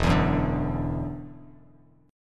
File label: E 7th Suspended 2nd, down, Bass Standard; E7sus2; strummed